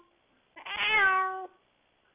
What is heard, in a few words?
cat meow